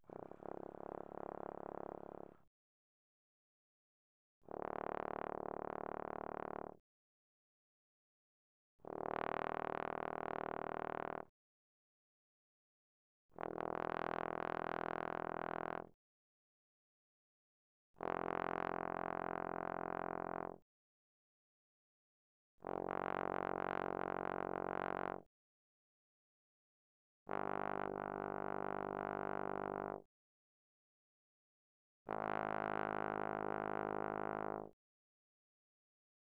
Bass Trombone, mf, Db1 B1; Brass

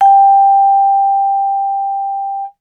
Vibraphone short sustain ff G5 stereo; MIS Pitches - 2014; Percussion

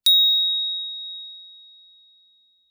bells brass ff Bb7; Percussion

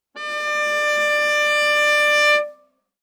Viola arco ff sul D D5 stereo; MIS Pitches - 2014; Strings

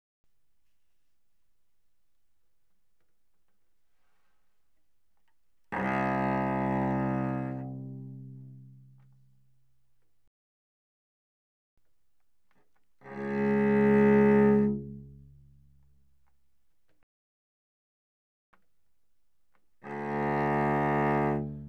Cello arco mf sul C C2Ab2; Strings

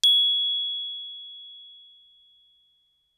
bells plastic ff Ab7 stereo; Percussion

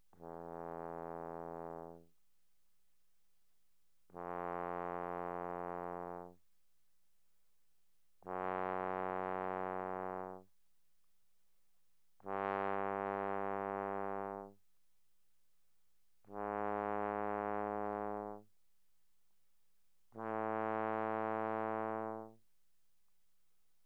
Tenor Trombone, mf, E2 B2; Brass

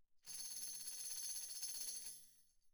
tambourine shake roll pp; Percussion

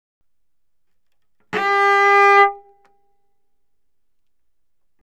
Cello arco ff sul G G4; Strings

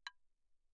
clave, hand percussion; claves

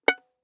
Violin pizz ff sul D Gb5; MIS Pitches - 2014; Strings

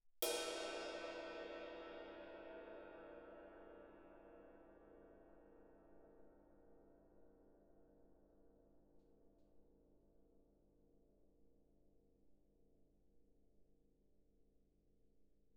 ride stick shoulder mf, suspended cymbals; Percussion

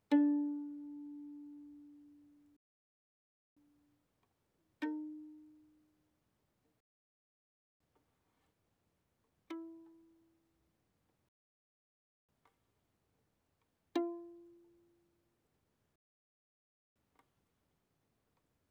Violin pizz pp sul D D4 B4

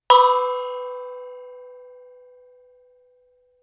thai gong ff B4; MIS Pitches - 2014; Percussion

